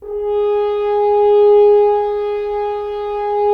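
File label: Solo French Horn; Roland - LA Composer vol. 4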